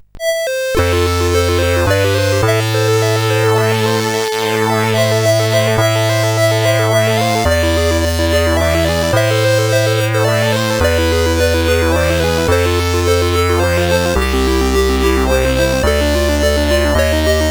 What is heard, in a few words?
Slow and Sad Intro sid CSG8580R5